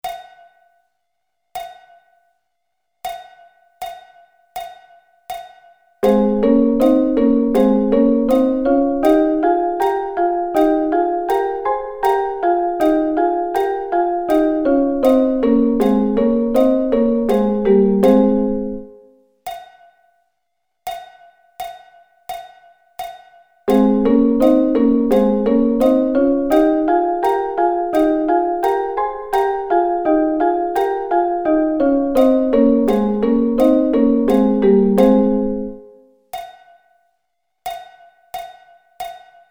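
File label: A flat Double Stops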